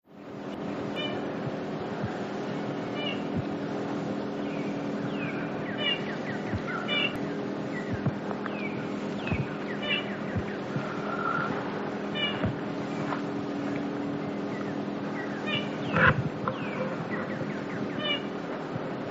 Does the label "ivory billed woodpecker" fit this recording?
Yes